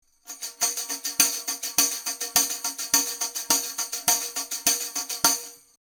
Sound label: Neumann U67 Tambourin Figure8 Front